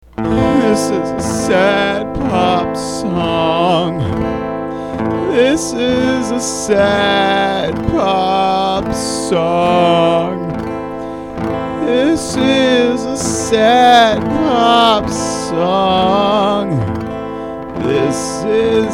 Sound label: sad pop